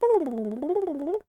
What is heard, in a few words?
turkey long